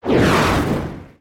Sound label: skill effect